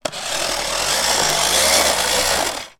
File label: toy motorcycle or car pushing; sound effects one